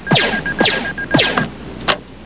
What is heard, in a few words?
knight rider computer